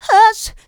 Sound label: Female Vocals; Houseworx